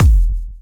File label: drum hit whistle